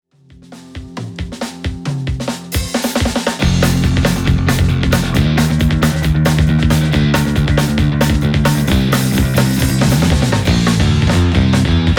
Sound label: Pick Reverend Guitars Decision P Midnight Black